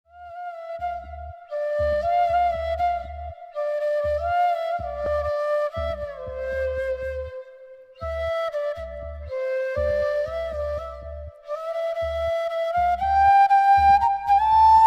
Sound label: christian bgm ringtone telugu christian song; jesus